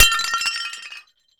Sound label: plate